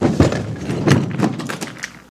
Articles Falling Fr

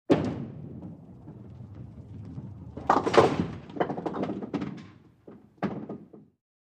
Throw Bowling Ball Ro

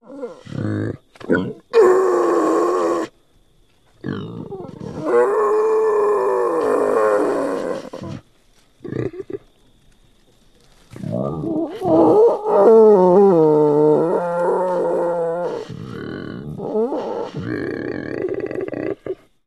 Boar Wild Grunts Grow; boars

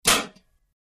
Air Mortar Blast From

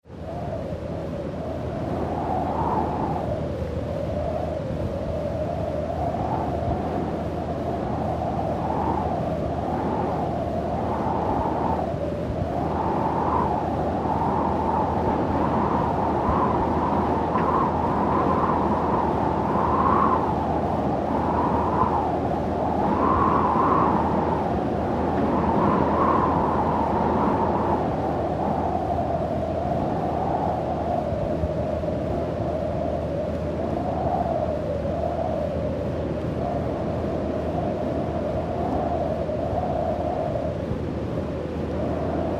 Desert Wind Sand

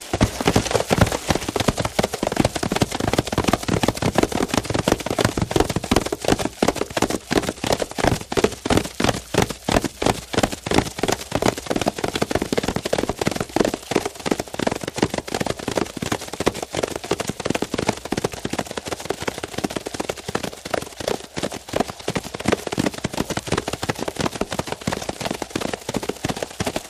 Group Horse Gallop To Stop, Foley Effects

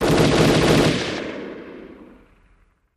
Automatic Weapon

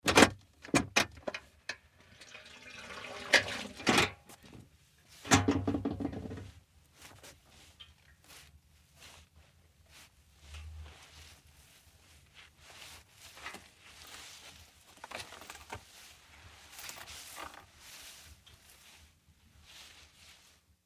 Bucket Set Down Squee; barrels buckets & cans

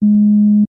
Beeps Single Tones Sh

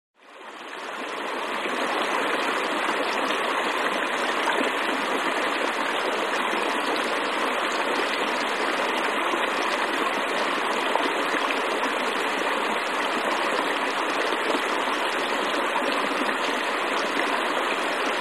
Stream 2 Medium Size S, streams

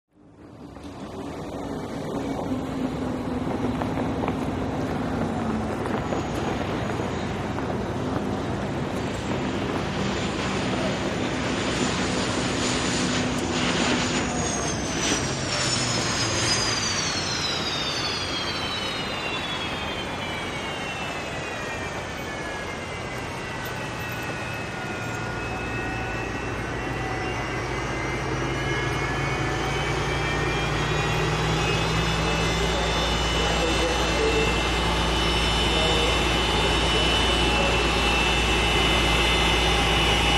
Hydroplane Dock Amb

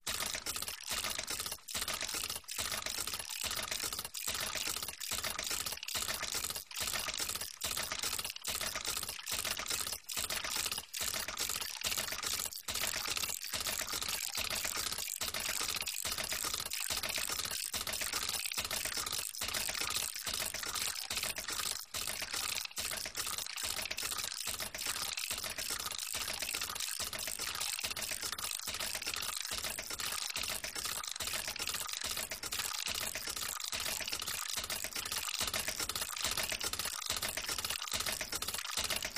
Frantic Robot Aggres, servos

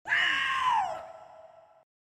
Cheerleader Screams

